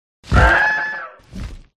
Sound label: roha attack voice up